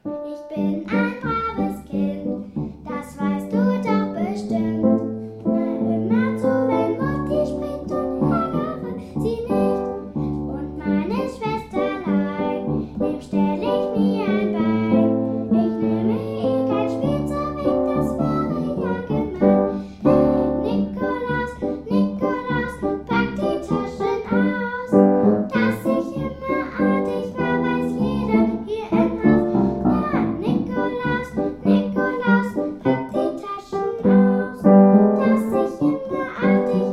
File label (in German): Nikolaus pack die Taschen aus mit Gesang, Orchester